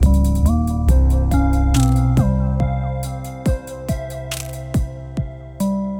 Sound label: Mystery Pads; BB 2HTC Samples Vol 4